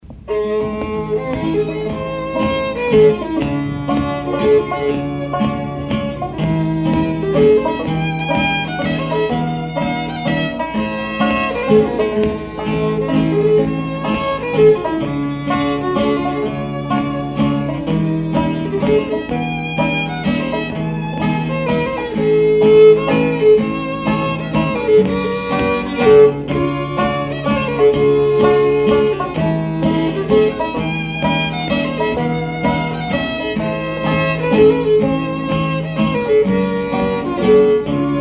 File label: Waltz